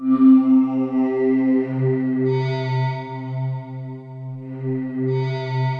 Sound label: SLOW-WAVES, ENSONIQ TS-10; Rubber Chicken Software Sample Bank